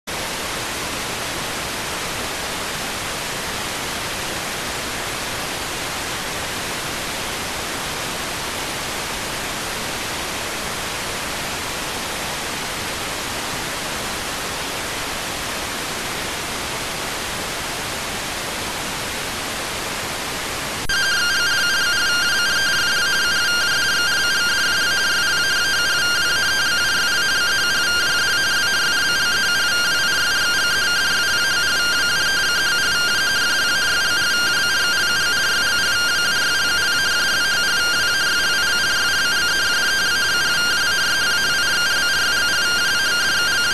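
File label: radioteletype receive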